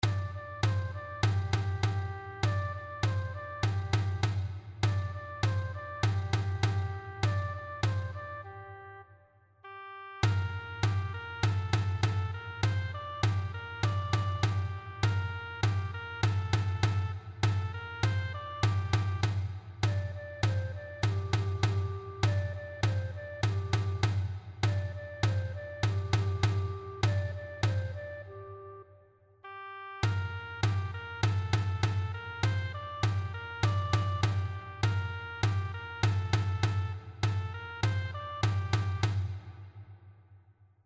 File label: PERC; Alabanza al fuego; Colegio R. Steiner/ Lima